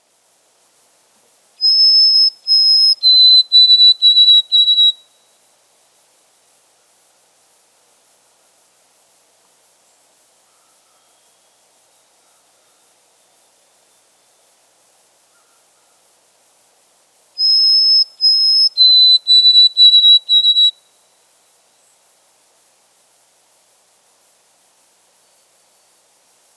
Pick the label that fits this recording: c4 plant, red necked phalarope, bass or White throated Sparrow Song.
White throated Sparrow Song